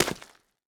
mud, footsteps

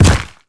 step heavy, zombie blind; Half Life Alyx; creatures

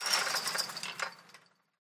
chain link rattle, metal; Half Life Alyx

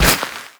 carpet impact bullet; physics; surfaces

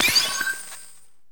ui sub menu; Half Life Alyx

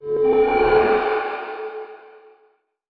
crafting ui appear; combine machines; Half Life Alyx

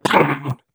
ballbot ping; player; Portal 2

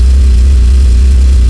machine, machines; Half Life Model Pack; Half Life 2

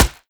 dirt, bullet impacts; physics; Half Life Model Pack

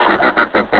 laugh, combine suppressor

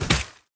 step light, footsteps, player, mud

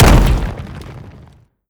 bash hard, brickwall, destruction

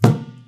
rubber tire impact hard; physics

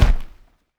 bag damage; Counter Strike Model Pack; CS:GO; survival

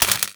electrocuted spark

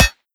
metal prop, bullet impacts; Half Life Model Pack

